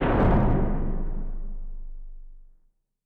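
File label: Collision Interior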